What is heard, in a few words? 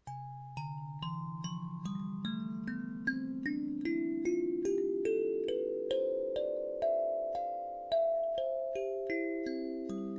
alto, kalimba